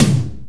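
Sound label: DR550 Tom High, Boss DR-550 Drum Machines; 300 Drum Machines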